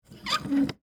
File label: Metal Hinge Open, Foley, Metal, Hinge, Open; Latches; Objects; BlastWave